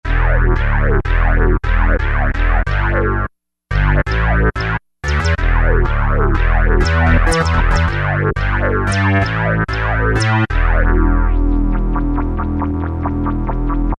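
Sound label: bass-DB Wow; E-mu Orbit V2; Factory presets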